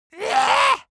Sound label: creature dwarf disgusted